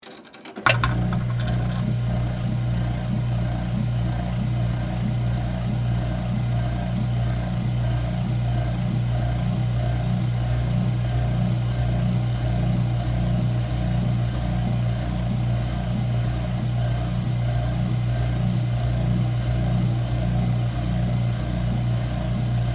Technology, Arcade, Coin, Operated, Horse, Ride, On, Run, Off; Arcade Sound Effects; BlastWave